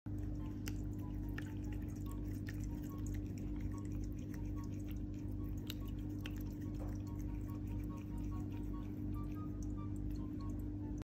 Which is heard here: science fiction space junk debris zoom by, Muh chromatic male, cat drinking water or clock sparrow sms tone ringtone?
cat drinking water